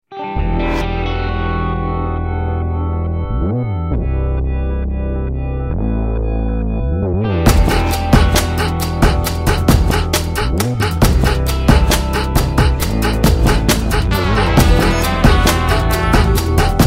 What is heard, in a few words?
tamil love ring tone